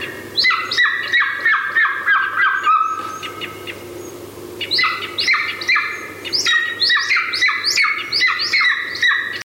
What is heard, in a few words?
golden eagle cry